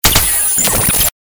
Galery Noise; Sound Ideas - Galery Noise 001

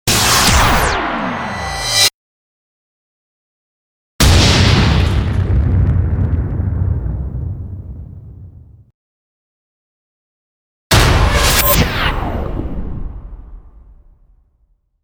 EXPLOSION

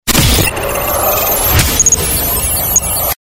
Galery Noise; Sound Ideas - Galery Noise 002

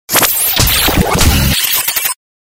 Galery Noise; Sound Ideas - Galery Noise 002

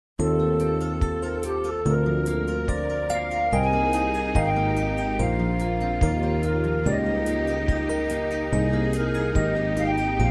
Easy Listening & Classical; Sound Ideas

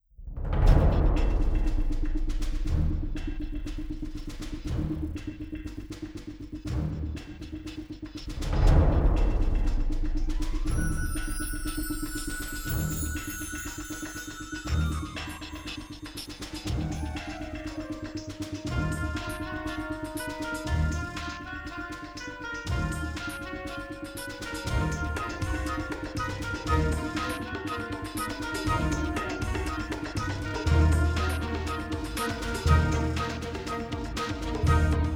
NEWS BEDS

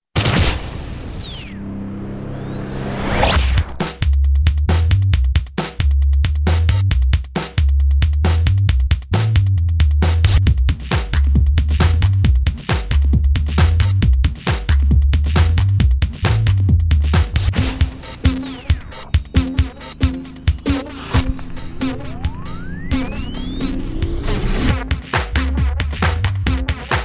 bass n synth, bed

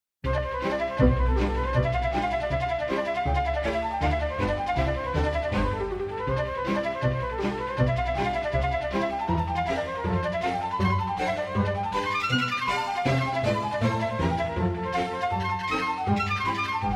Easy Listening & Classical; Sound Ideas

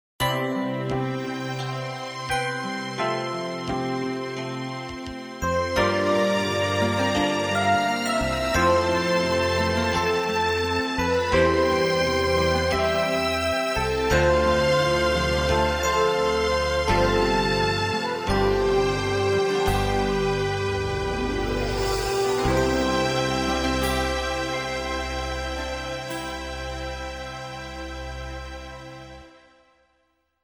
Easy Listening & Classical; Sound Ideas

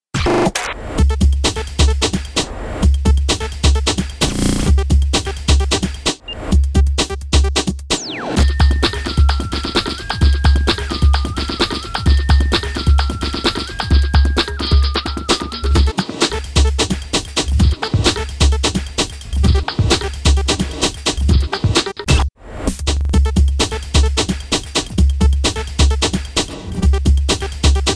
bed technoey

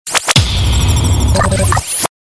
Galery Noise; Sound Ideas - Galery Noise 001

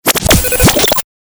Galery Noise; Sound Ideas - Galery Noise 001

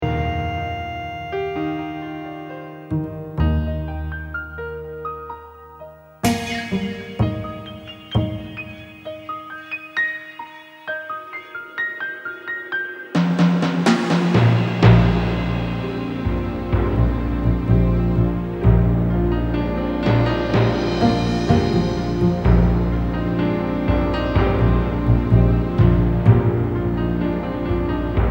Floating In The Air, Orchestral; Sound Ideas - Orchestral 01

